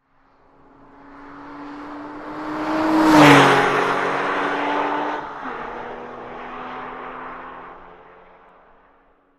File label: 911 997 passing by